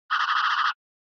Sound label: SNES Racing Tire Squeal